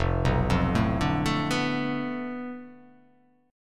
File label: F 6th Flat 5th F down, chord